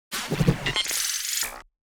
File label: Access Granted